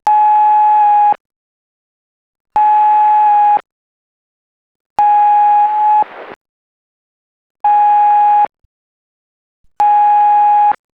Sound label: beep